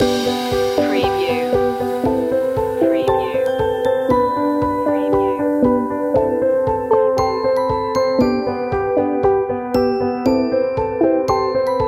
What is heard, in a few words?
gentle rain loop